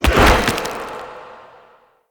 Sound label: Mockery Collapse Husk Trap; Enemy; Foley; Mockeries; Characters